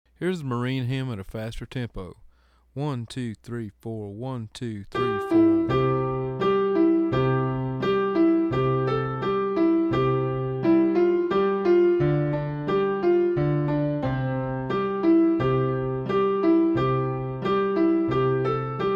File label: Marine Hymn Fast